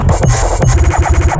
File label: Glitch